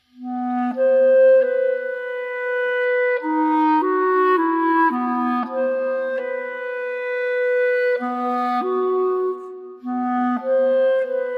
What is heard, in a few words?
Only Clarinet